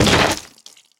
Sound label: zomb body impact; kfsounds; css